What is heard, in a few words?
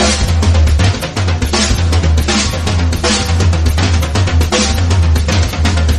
drums; horde